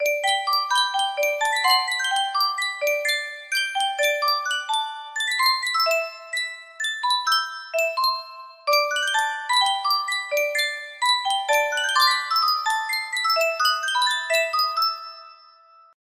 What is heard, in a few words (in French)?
Yunsheng boite a musique